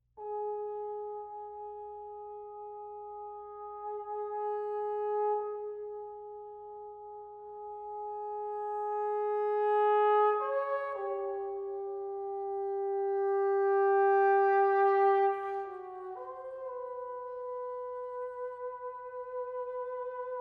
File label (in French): Double Concerto pour Trombones; Mvt. 2. Theme, Variations